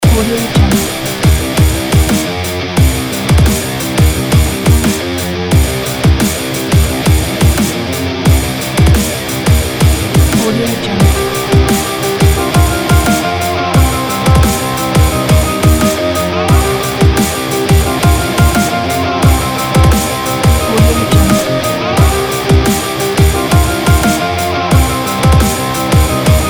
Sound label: drumstep